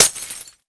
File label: glass; Scratch; Mine